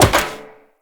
Tenno Wall Jump Metal Perforated, footsteps, wall run; Characters